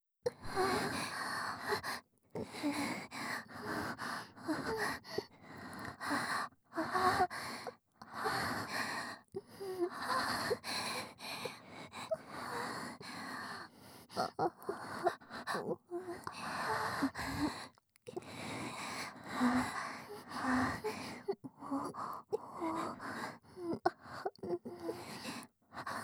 激しく 喘ぎ声 ループ

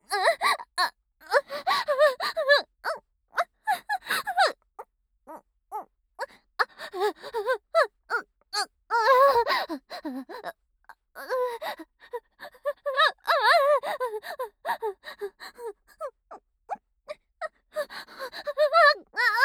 正常位喘ぎ

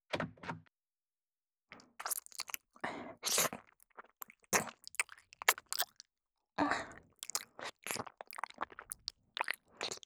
耳舐めオホ声対面立位; セフレ養護教諭の積極的アプローチ

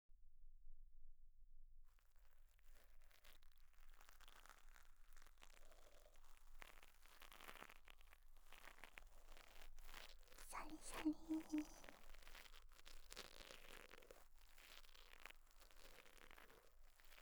シャリシャリ耳かき L

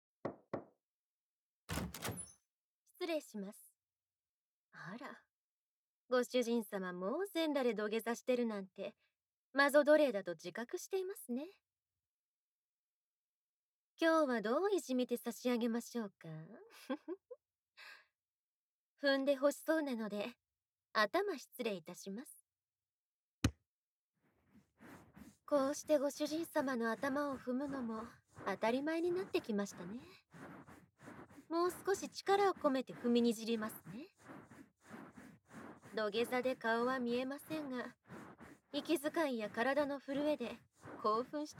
（唾音大） 乳首責め耳舐め唾吐き調教, loud spitting sound; SEあり・With SE

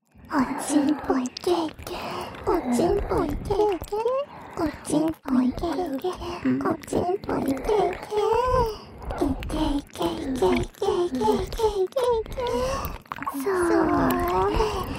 射精しちゃえ～～♪ ＋ 両耳 舐め; おまけループ（オナサポ）; メスガキるびぃに馬鹿にされたい！

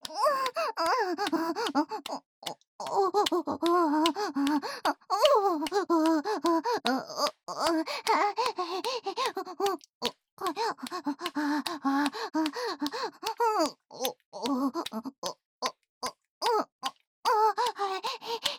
オホ喘ぎループ; オナサポ

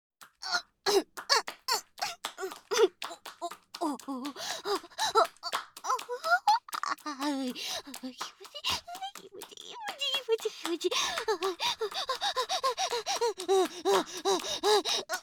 オホ声; SEあり

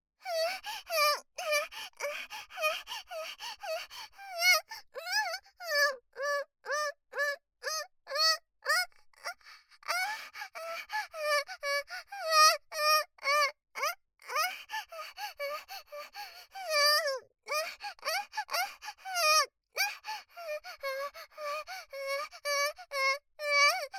おまけ喘ぎ声リピートボイス; 悠里ちゃん

